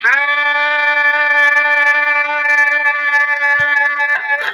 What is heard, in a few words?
scream long